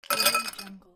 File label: put ice cubes in glass